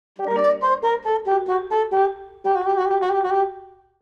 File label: Game over you lose tropical